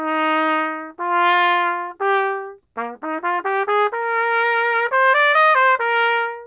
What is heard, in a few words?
trumpet; Spectrum Interpolation Synthesis for the Compression of Musical Signals